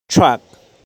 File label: Truck, transport; Transporte